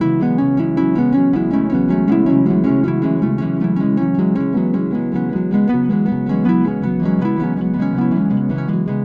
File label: morning horses loop; Building Loop